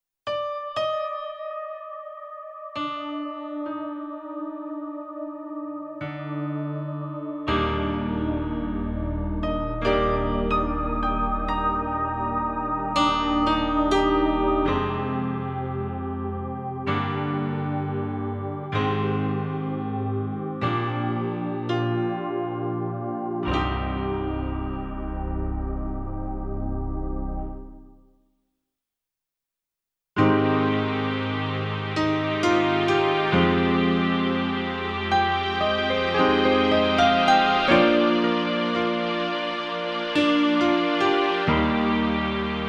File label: MP11 Pianos